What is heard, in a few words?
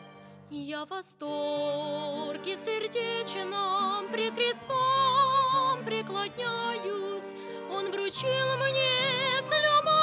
Vocal